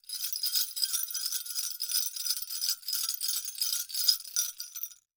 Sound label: Cartoon Bell Sleigh Bells Shakes Short; AdobeCC